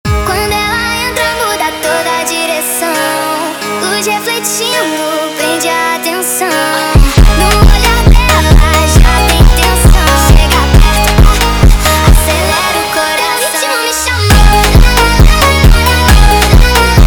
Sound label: Funk sped Up